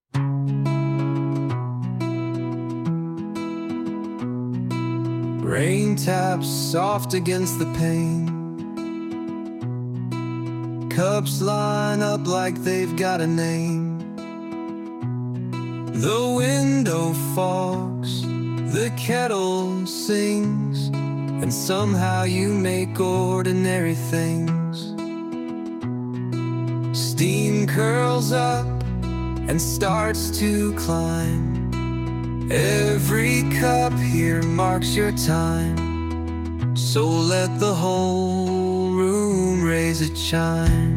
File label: birthday, name songs; harley